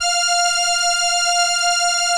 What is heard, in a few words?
ELKA, String Machines